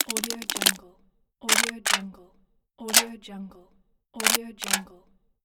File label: can crush